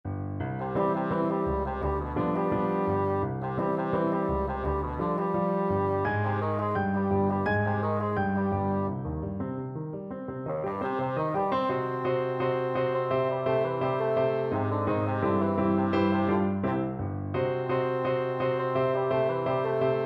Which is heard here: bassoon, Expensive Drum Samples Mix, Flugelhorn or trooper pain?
bassoon